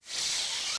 ls jedi off, weapons

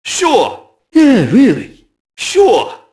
mobster group noise; enemy; Max Payne

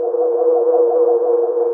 UFO LOOP; BedRoom

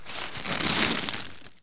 Herb chew, Dino

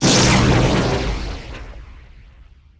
explo gas; impacts explos

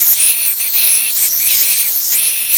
Smoke Grenade Gas; PowerUps

